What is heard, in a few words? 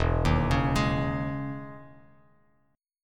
E Major 13th down Bass Standard